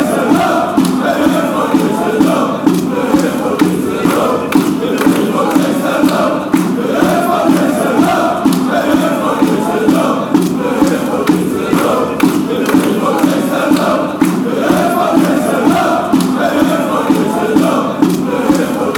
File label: fanchants; stand up if you hate